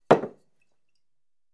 Bottle Up And Down On Table; Grab Bottle From Table With Light Liquid Slosh And Set Down. - Bottle Set Down